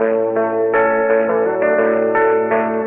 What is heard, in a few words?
guitar country, Phonky Loops